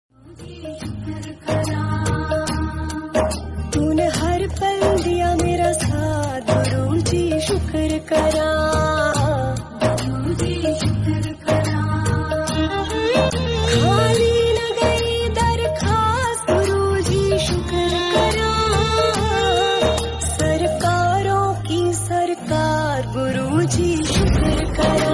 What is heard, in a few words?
shlok bhakti devotional sanskrit; ganesh ji